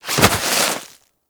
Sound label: Generic Roll Snow; Warframe; Characters